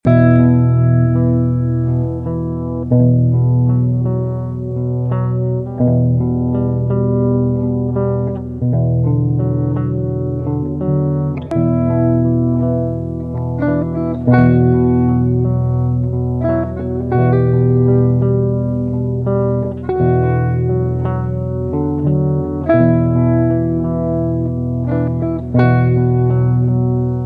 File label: game over with bass